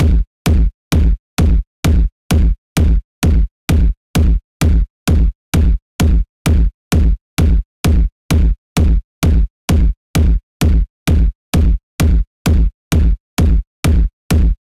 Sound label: kick drum as israeli trance